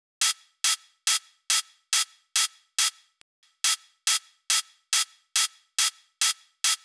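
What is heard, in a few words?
drum Loop 140BPM overhead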